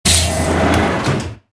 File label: door medium; movers